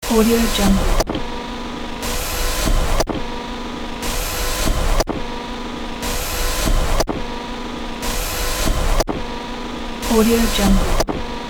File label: rogue android walking injured; sound ideas